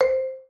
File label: countdown